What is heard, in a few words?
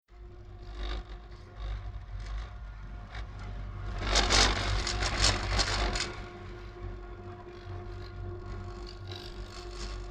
Sport Ski Snowboard On Chair Lift Heavy Rattling Mechanical Approaching End; Skiing And Snowboarding Sound Effects